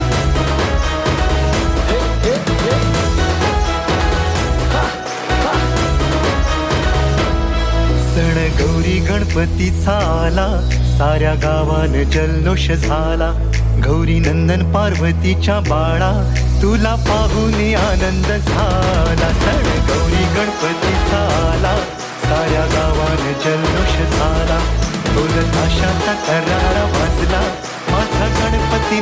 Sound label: Ringtone, Flute